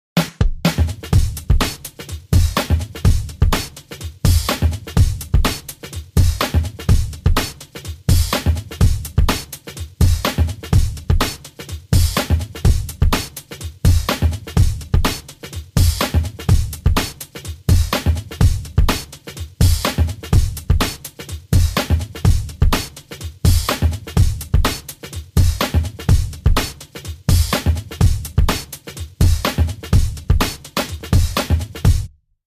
Beat

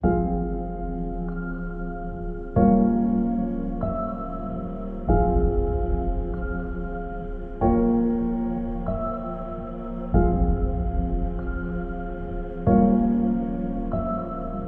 No Drums and SFX

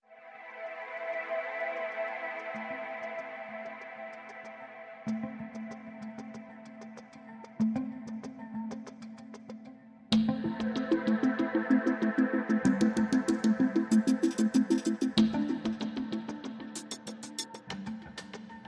Mysterious Shadow, No Lead Guitar

